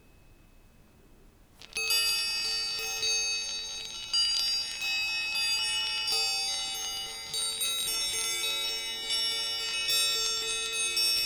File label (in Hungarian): tenyérmozgatás, golyók tenyérben mozgatása; Perkupa; hangkeltés